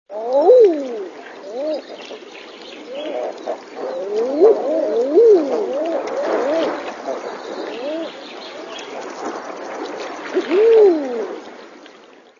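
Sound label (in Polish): edredon, głosy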